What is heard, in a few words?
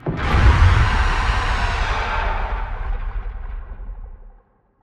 cdf blowout, anomalies; Arrival